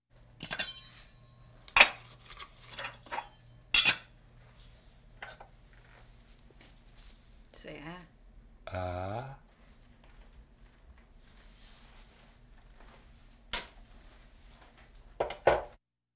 Hospital effects

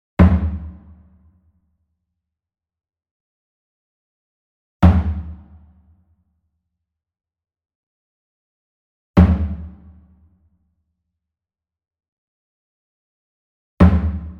Small Drum Fontonfrom Hit Hard Beater Medium; boom library; Cinematic Strikes Construction Kit

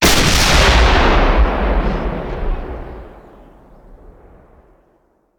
Artillery Cannon Artillery; Sound Ideas; Guns

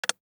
DICE on Glass, Drop, Standard, 1 One Die; Samples; ArticulatedSounds; DICE Sfx Party (Extended)

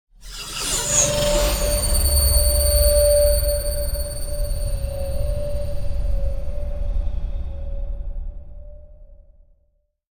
Drone Feedback Monster Scary Logo; Hollywood Edge; sound designer's toolkit v2

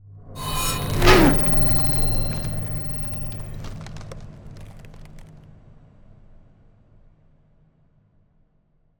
Ice Spell Whoosh, Cold Whooshes; Arctic Aura; EarBreeze

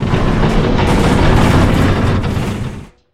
Creak Large Metal Tower Fall; SFX Kit; Creaks, Scrapes, & Misc

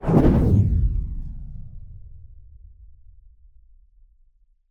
Whoosh Soft Explosion High Sub Energy; Hollywood Edge; sound designer's toolkit v2

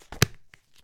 Book Close; SFX Kit; Foley - Misc

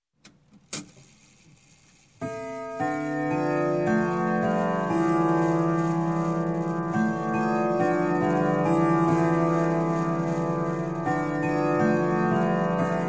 Clock, Grandfather Winchester Chime - Strike; Strike Six O'clock; Sound Ideas 2000 Series

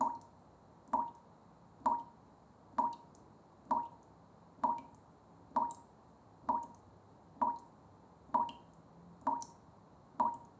Tap Drip; blindside

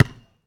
Street Ball Bounce Asphalt; SFX Kit

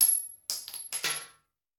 Bullet Shell; Sound Ideas; Guns

